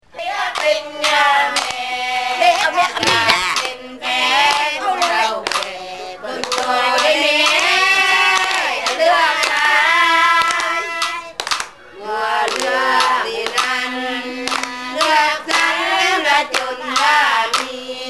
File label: lao party